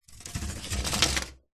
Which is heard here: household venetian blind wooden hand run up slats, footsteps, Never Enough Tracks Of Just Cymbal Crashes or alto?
household venetian blind wooden hand run up slats